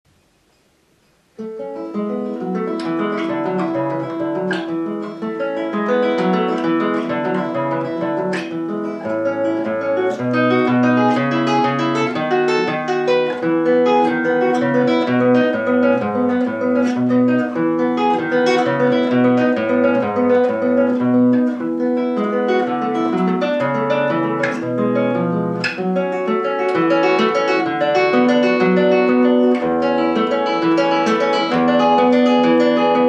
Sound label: Etude, guitar